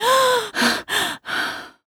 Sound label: voc gasp female; oblivion; Realistic Fatigue